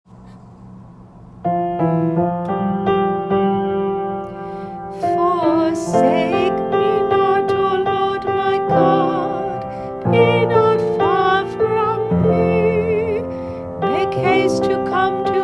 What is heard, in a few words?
Antiphon; 31st Sunday Ord